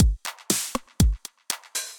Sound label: Kit-909, Effected Drum Kits; Kit-909 Mastodon; Ableton; Factory Packs